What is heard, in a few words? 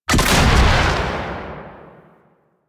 RPG Shot; Hellion Elite